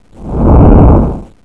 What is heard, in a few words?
Flyby; MacAddict; HAVOC Demo